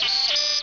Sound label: time up; arcade; gubble